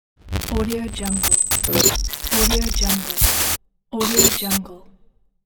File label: glitch